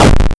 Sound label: tank attack; tanks of freedom; units